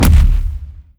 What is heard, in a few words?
bass heavy, zombie blind step